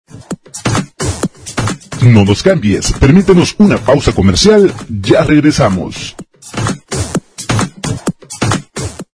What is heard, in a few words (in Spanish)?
pausa comercial entrada